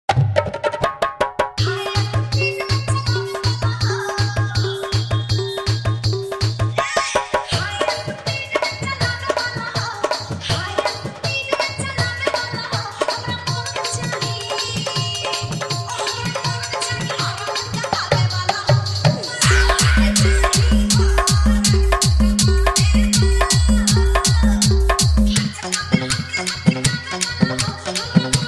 hard dholki dj remix; virendra babu hi tech

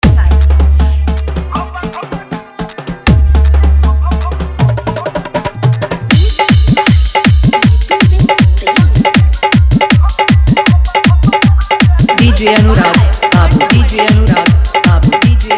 New Viral Bhojpuri Full Dhollki Bass Dance Mix

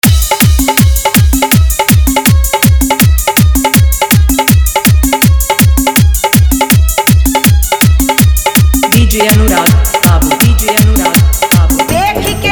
Old is Gold Dhollki Bass Rod Show Garda Dance Mix

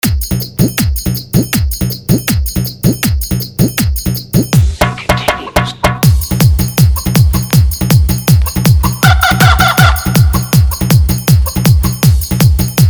Dj Remix Hard Bass Mix

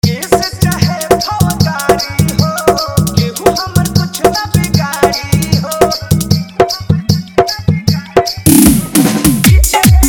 New Viral Bhojpuri Fully Dhollki Bass Remix

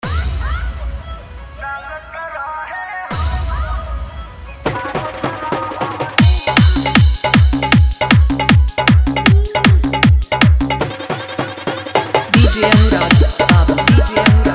Bhojpuri Dhollki Bass Dance Remix

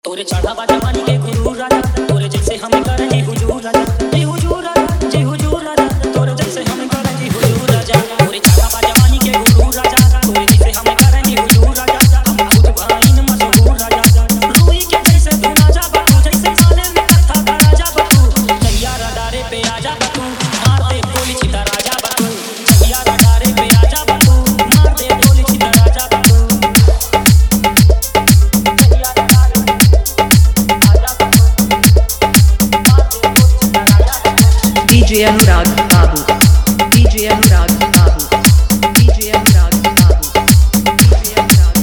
Hard Dhollki Bass Mix

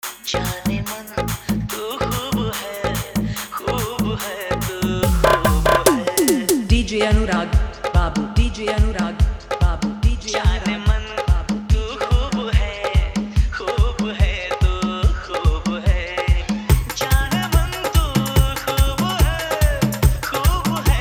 Old is Gold Hindi Dhollki Mix

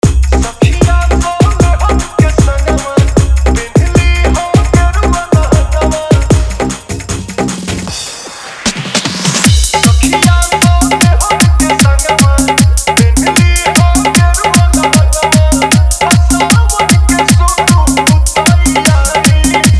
Bolbam Spl Dance Beat Fully Dholllki Bass Mix

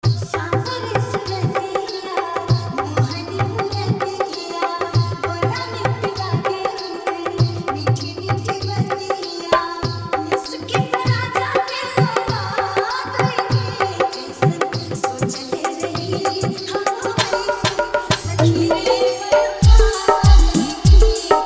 Sad Hip Hop Jhan Jhan Bass Mix

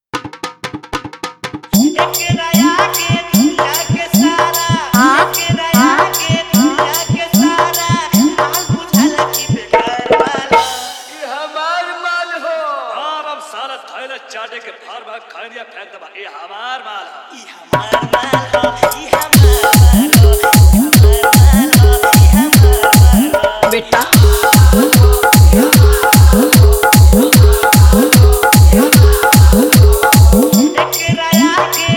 Dj Song Full Hard Bass Ekdam Garda Mix Old Song

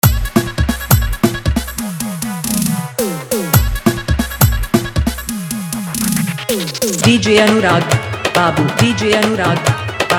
Hard Dhollki Bass Mix

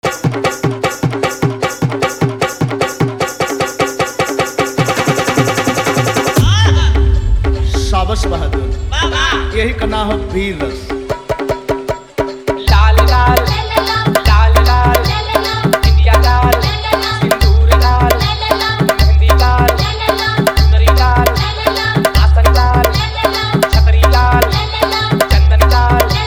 Garda Bass Navratri Song

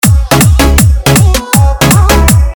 Basser Bass Mix

